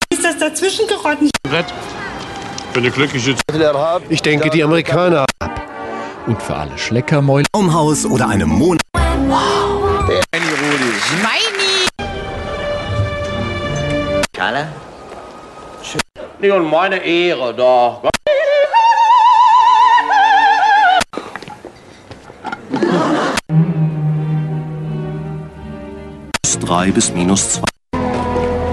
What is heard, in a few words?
Zapping German Cable tv program Saturday evening